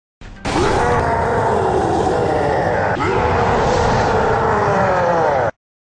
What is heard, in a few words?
roar